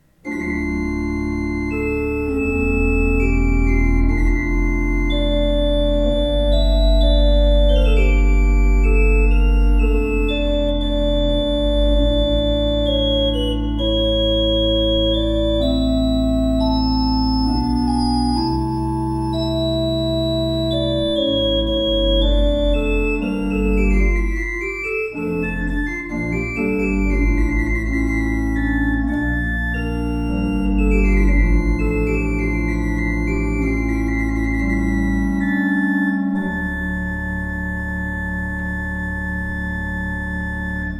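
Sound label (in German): Schuke Berlin, pfeifenorgeln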